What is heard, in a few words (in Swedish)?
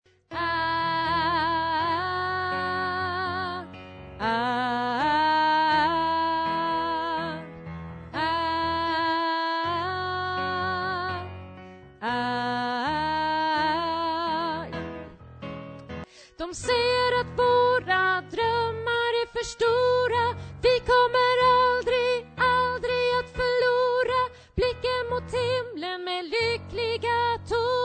bas, stammor